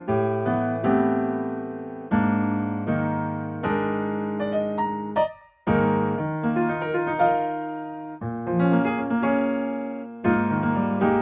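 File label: transposed accompaniment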